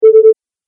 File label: Sine Wave Confirmation, beep